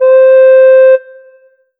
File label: Alesis Fusion Ocarina C5